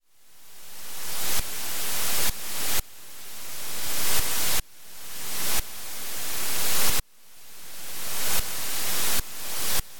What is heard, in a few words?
exponential ramp, Analog Sound Synthesis